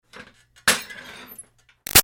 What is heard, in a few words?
Ironing Board Metal Fold Out Screech; Miscellaneous Household; BigRoomSound